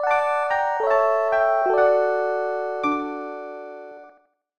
Inn Jingle, SNES; Final Fantasy 3

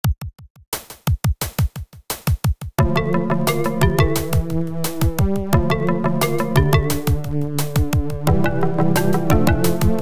DOS

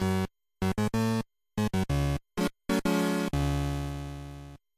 C64 Crime Scene, Trap; Murder on the Mississippi

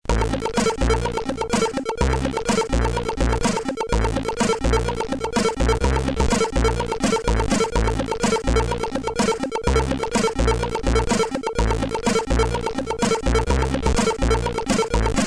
C64 Level Completed; Santa's Xmas Caper

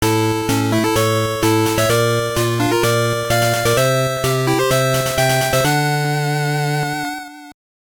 All Stage Clear; Mega Man III; GB

